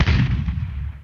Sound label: Bullet Bill Cannon; New Super Mario Bros. Wii